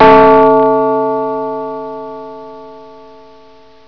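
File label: clock bell; quake; fortress; haunted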